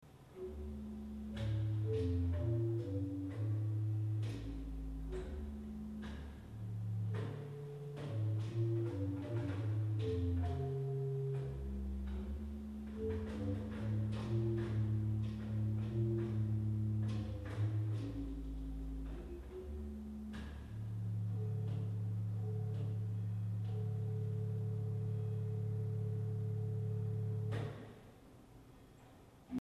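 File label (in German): Subbass, Orgelstimmen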